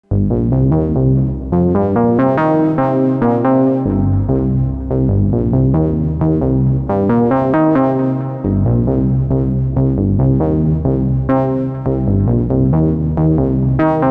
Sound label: Micromoog Bass